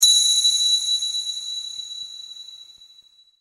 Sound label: ping